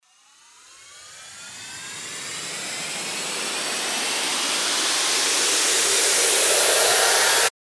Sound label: RISER